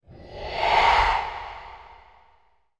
Reverse Surreal Breaths, Horror; Sound Design

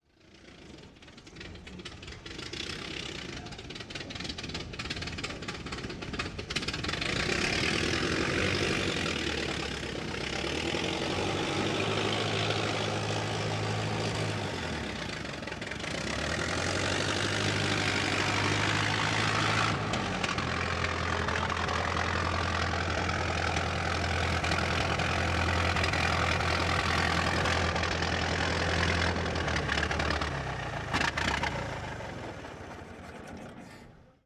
Ford Model T Start Maneuvers Stop; Period Vehicles

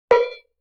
Cowbell Mid Damp; Vintage Cartoon; Drums & Percussion

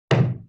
Wood Hit

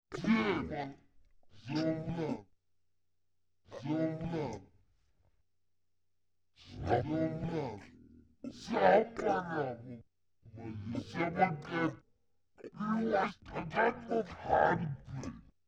Evil Underling, Vocals - Humanoid

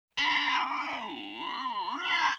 LoFi Synthetic Bird Caw

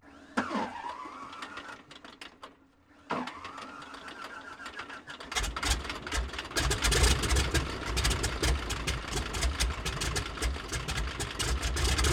Plane Start Idle Off; Brossard; Aircraft; Vehicles

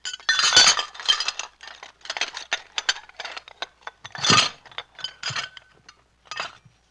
Pickup Dishes Tablecloth; Vintage Cartoon; Foley

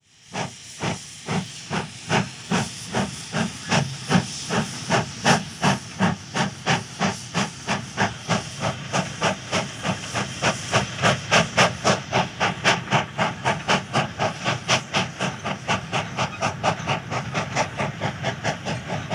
Steam Train Slow To Fast Chug Away; Period Vehicles

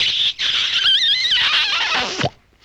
Kiss With Pop, Vintage Cartoon Comedy Vocals

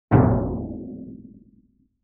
Timpani Hit Bright; Vintage Cartoon; Drums & Percussion

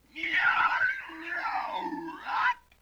LoFi Synthetic Squawk

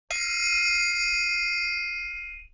LoFi Triangle Hit; Vintage Cartoon; Drums & Percussion

